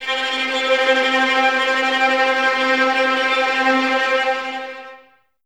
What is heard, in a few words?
Violins Tremolo C 4; Miroslav Vitous; 11 Violins